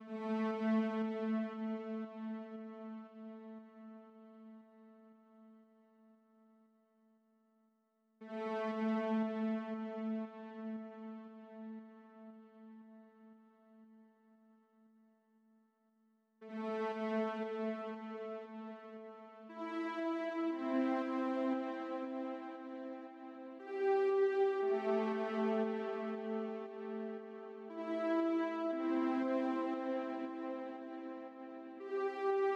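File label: 117 BPM A Minor Mono Pad, Sunset; Melodies; Loop Stems; Cymatics; GHOST (Beta Pack); Blizzard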